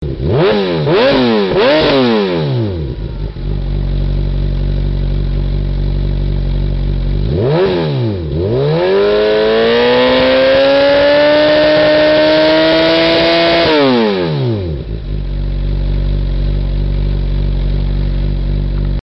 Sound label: CBR1000RR Twin Replica Full System, exhaust sound; honda; Arrow